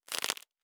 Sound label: Rope; Survival Game Sounds - Lite Edition